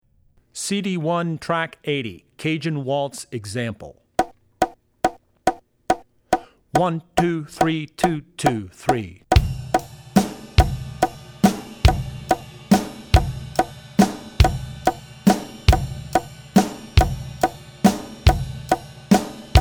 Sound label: Cajun Waltz; Drummer's Bible Tracks